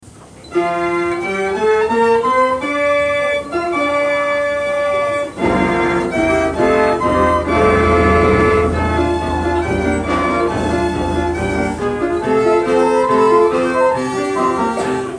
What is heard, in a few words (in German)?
mechanisches Musikkabinett; siegfrieds; ruedesheim